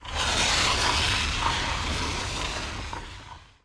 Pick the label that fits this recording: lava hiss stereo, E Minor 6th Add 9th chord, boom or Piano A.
lava hiss stereo